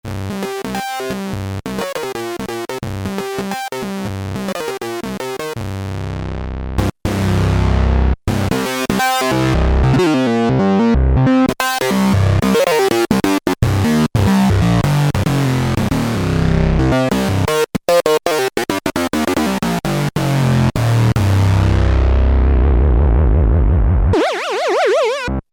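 Sync, Memorymoog Classic Analog Polyphonic Synthesizer; 18 Sync 2; Factory programs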